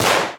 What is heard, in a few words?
tazer; Weapons; EK modern; Barotrauma